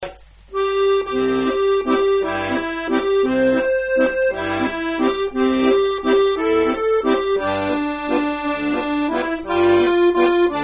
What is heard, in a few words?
Mystery Melody Accordion